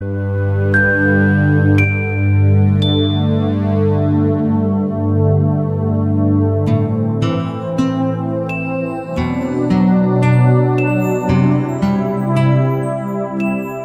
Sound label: Relaxing Sms Sound